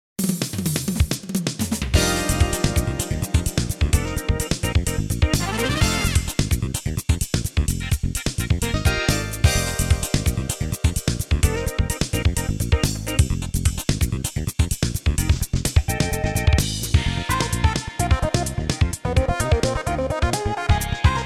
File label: fusion